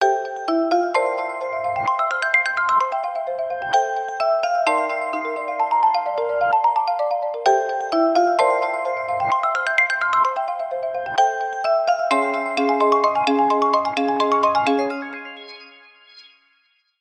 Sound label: surging power